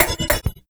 Metal; Sonic Foundry (Sony Creative Software); Esoterik Beatz; Bello

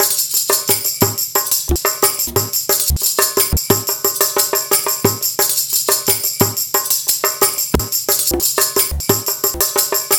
Modern Percussion Loops, MPC60; Soundscan vol.56; AKAI

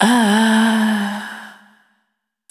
Argon, Vocal Samples, Single Voice Melodic Loops; Singles

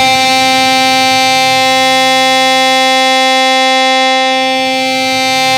C4, Synth Line; 90 s Sample CDs; NiceBeats; SynthLine VOL-33

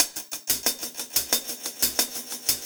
Cymbol Particle; Particles

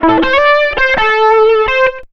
SOLO L, Electric & Acoustic Guitar Loops; USB Soundscan vol.04; AKAI; 120 SOLOS

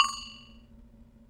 Perc, Percussion and FX; Pearl SC-40

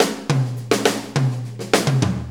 4-4 Fill, Drum Loops; Sonic Foundry (Sony Creative Software); Loops & Samples Drum Tools

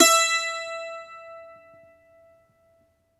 MANDOLIN, Plucked Strings

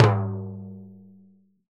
TIMBLO, Synclavier Percussion; ILIO; Synclavier Percussion 2; World & Orchestral

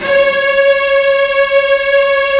STR, Roland LA Composer

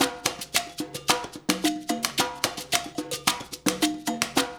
LOOP, Percussion; InVision Interactive; Percussion Slam

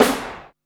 FACE BEAT, SNARES; Back In Time Records; Big Bang

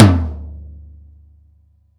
TOM D, Drum Dry; East Collexion; Drum 1

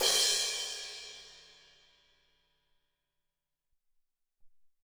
Amb Crsh, Alesis SR-18

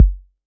Kick, Roland TR-808

